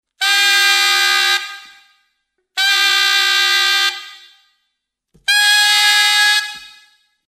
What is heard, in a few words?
fuzz